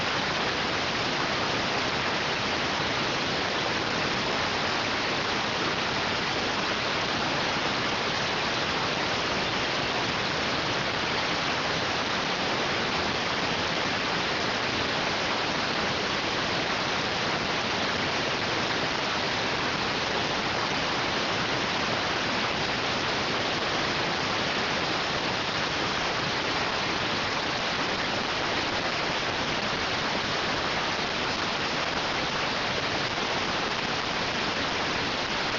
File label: rtty rx